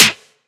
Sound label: snare oneshot; GhostHack